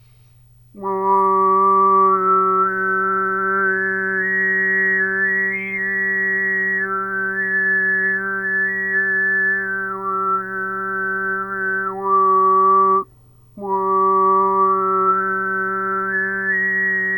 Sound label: Desert Lullaby, harmonic singing